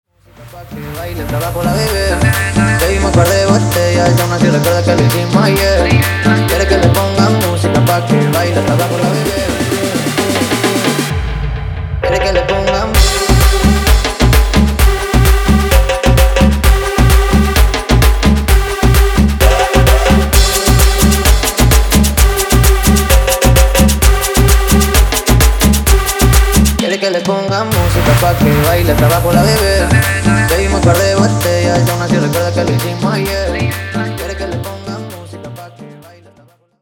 guaracha remix 130 bpm; Reggaeton dj remixes

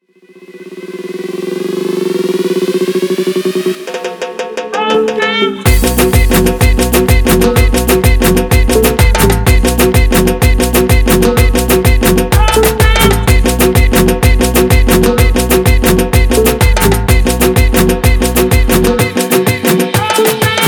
afro remix 126 bpm

